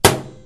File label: metal computer impact hard; physics